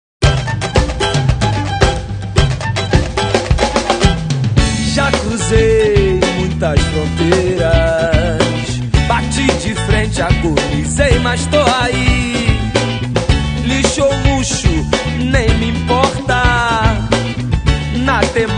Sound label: samba soul